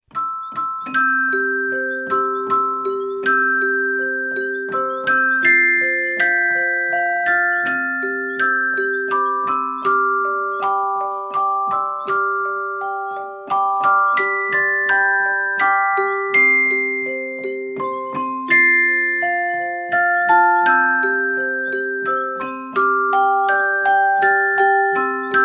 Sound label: Music Box German Lullaby